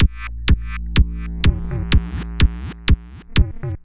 Beat 125BPM (Synths Kick), techno; techno drum fx

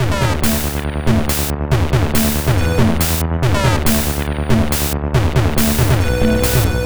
140 Combo Bm, 140 BPM; CHIPSHOP DELUXE; CHIPSHOP LOOPS

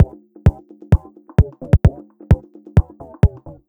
130BPM Atmos Kick, techno drum; drum fx; Beats

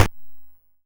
YM Perc, DRUMS; ChipShop Deluxe; ChipShop Hits

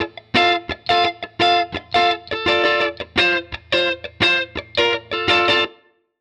Guitar Line, hiphop; musicradar

